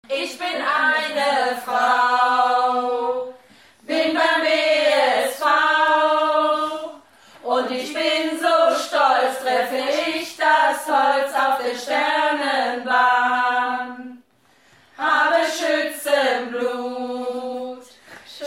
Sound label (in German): rap klingelton